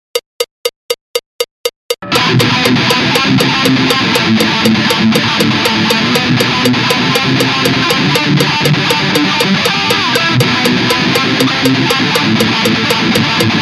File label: thrash